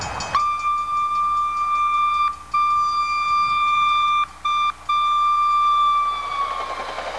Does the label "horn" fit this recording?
Yes